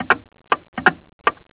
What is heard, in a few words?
clock rotate; Nokia; 5800 XpressMusic; Bounce Touch